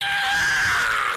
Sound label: scream